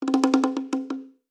quick spin disabled

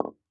ambience bet Sign; netent

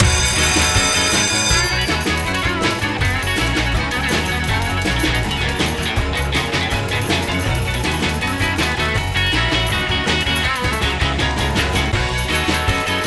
big Win Start

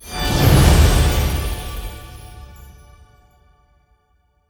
big Win Start; netent

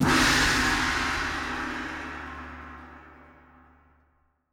continue Button; netent

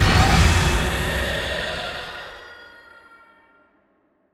wild death

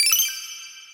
countup End; netent

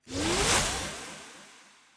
big win intro; fruitblaze; netent

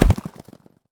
excitement spin end; netent

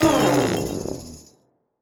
nearwin Fail; netent

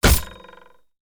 knife Hit; netent

